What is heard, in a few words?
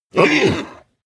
horse vocal hit